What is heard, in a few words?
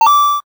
collect point; slime switch